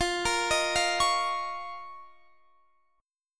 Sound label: F 7th Suspended 4th Sharp 5th F down Guitar Standard; arpeggiated; JGuitar